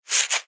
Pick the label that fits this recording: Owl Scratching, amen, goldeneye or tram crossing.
Owl Scratching